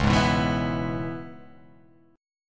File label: Db Minor 7th Db down, Dbm7 chord; strummed; JGuitar